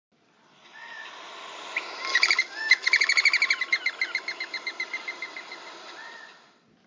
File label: Dove Wing Sounds